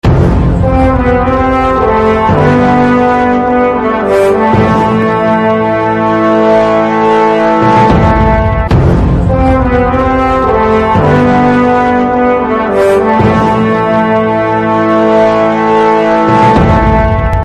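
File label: background Tone Telugu Ringtone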